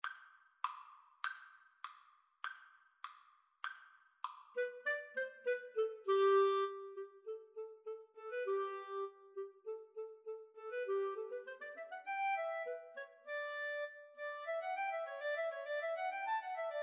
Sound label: Clarinet Cello Duet